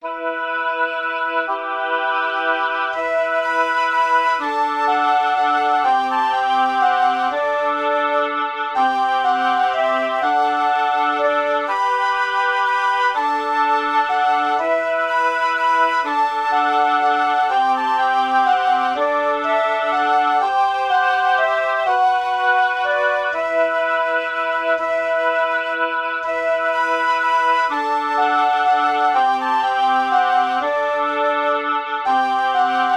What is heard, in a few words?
frog waltz